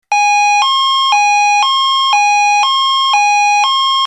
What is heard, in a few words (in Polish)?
ton